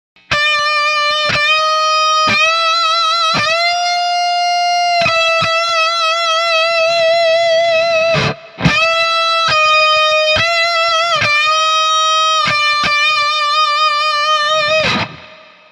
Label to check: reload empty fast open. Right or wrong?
Wrong